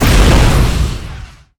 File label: Flame Thrower Shot Burst, Weapons